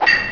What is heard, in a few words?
CLINK